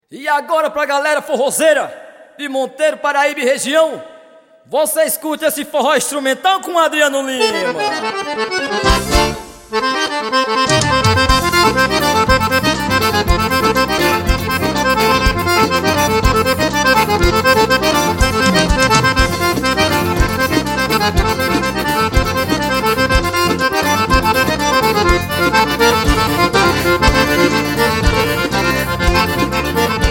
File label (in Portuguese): toque de pife